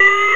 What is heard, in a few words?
hosting problem